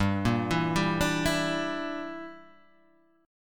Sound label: Bb 6th Flat 5th G